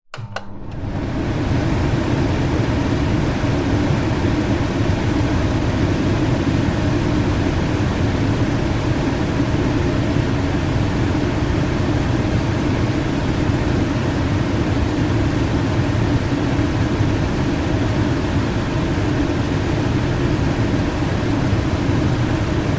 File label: household oven overhead extractor fan on run off